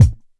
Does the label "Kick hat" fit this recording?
Yes